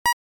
Futuristic click

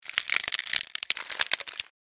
Chocolate wrapper foil unwrap open; paper sound effects

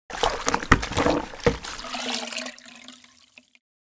Mop into plastic bucket cleaning washing mop

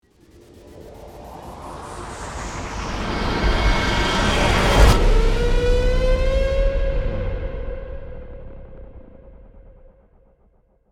Cinematic riser cyberpunk

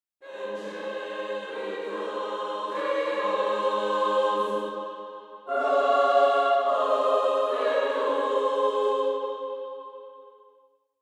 Angelic choir angels; angelic guardians watch over you

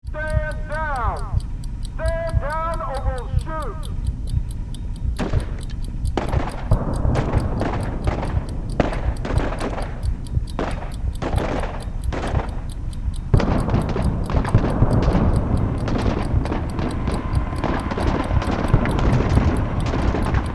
Hostage situation with bomb ticking

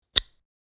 Headphone jack remove; headphones and speakers

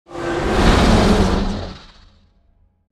Werewolf rage roar attack

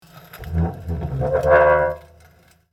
Metal fence short squeaky movement; squeak sound effects

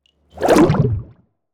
Cinematic water whoosh

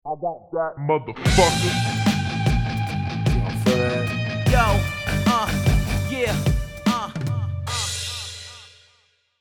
Aggressive thug life drop; social media

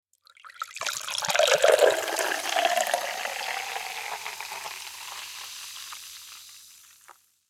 Pouring sparkling water fizz drink; Liquid

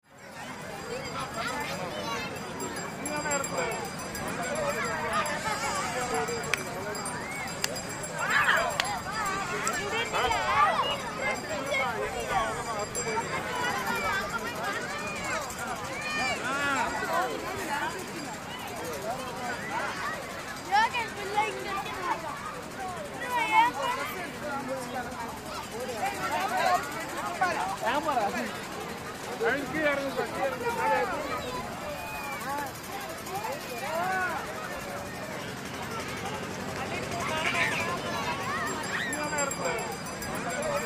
Beach resort ambience people talking daytime

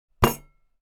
Linear steel table hit impact plates glasses

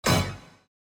Slot machine casino reel spin symbol hit

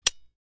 Steel padlock medium lock opening

